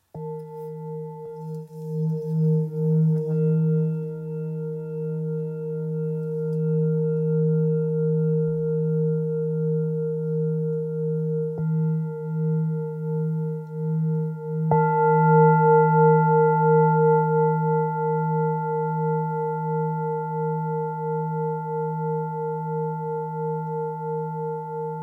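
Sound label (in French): Therapeute re diese, bols chantants tibétains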